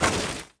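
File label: metal slide small; Star Wars Obi-Wan; XBOX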